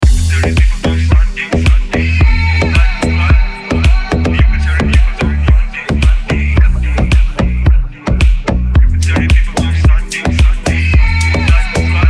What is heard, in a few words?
Deep Disco mix